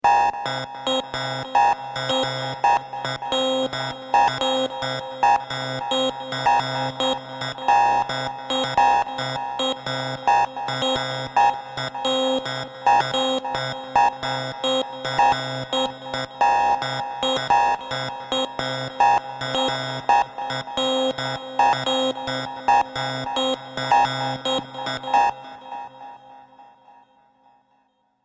Musical computing electronic futuristic sequencing; Musical Sound Design; Computing Sequence